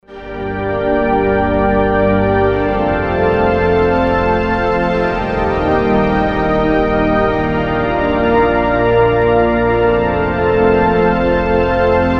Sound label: pad, roland mdc1